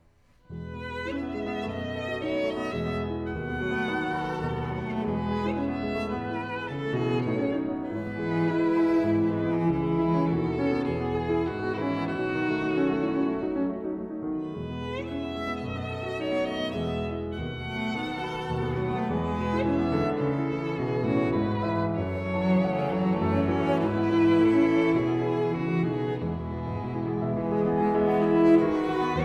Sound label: Piano Trio; Geneva Piano Trio; Tchaikovsky